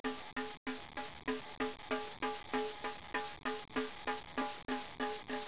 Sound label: tomtom; 12000 Graphics Pack - A Complete Graphics Collection For Your PC